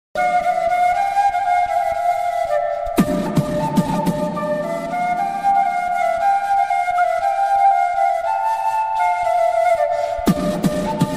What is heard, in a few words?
flute beat box ringtone